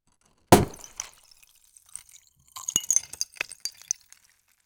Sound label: a glass watermelon sliced